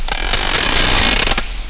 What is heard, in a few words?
momma attack